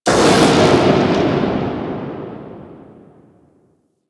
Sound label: Electricity Blast; Ambience; Props; Warframe; NineteenNinetyNine